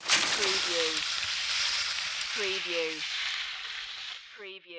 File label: Skiing, Snowboarding, Jump, slide and stop, distant